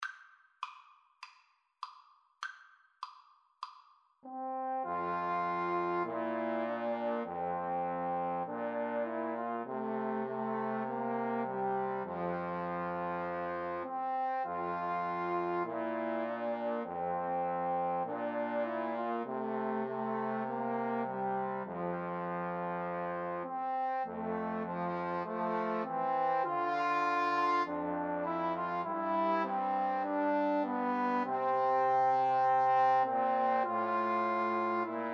Trombone Trio

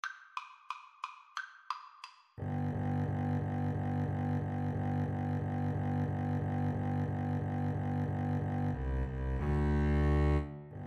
Double Bass Duet

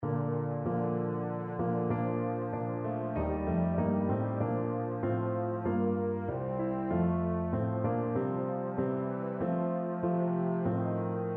Navy Hymn euphonium

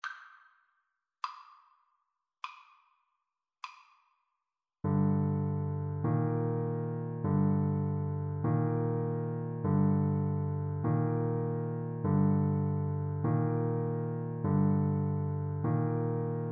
funeral march, Tenor Saxophone

